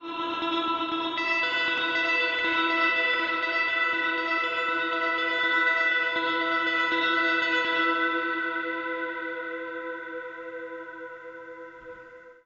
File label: Erica Synths Black Hole DSP2 Guitar endless reverb